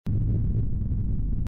rocket Sound

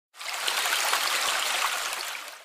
river flowing

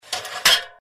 ladder extend sfx

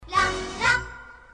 kids sing

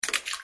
throw stone in water

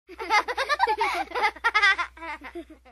kids Giggling And Laughing Sound Effect

